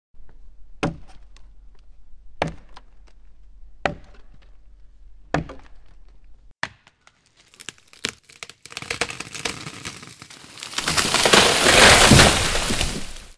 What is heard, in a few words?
axe tree fall